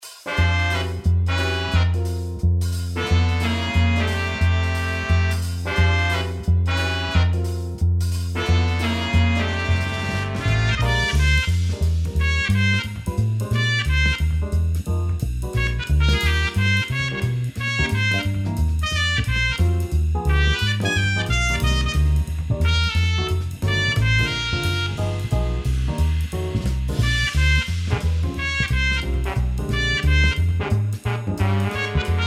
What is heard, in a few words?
blues